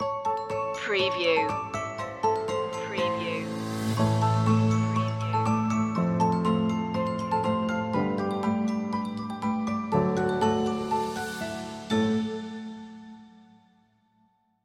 bell shoppe

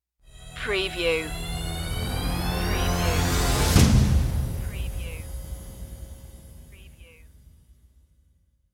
under fire stinger

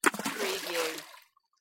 Rock splash

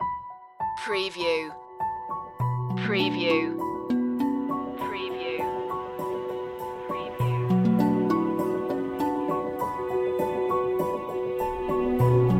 underworld series dramatic cue

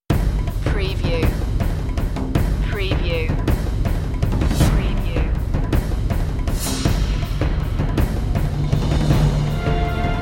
no choir version

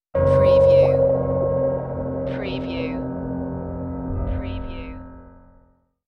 Dark Production Stinger

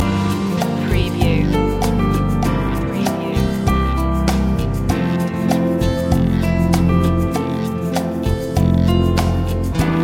romantic guitar loop

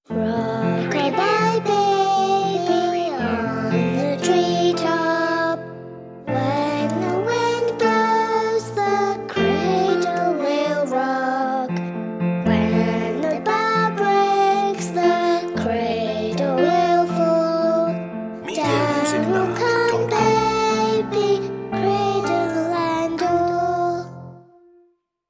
rock a bye baby children vocals short

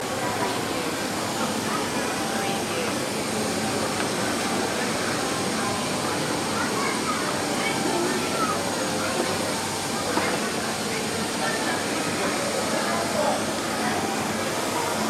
Tokyo busy street market city chatter traffic; lynne-publishing

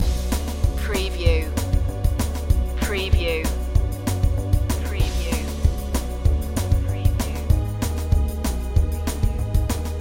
the spooky pumpkin head loop

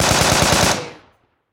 Machine Gun M4A2

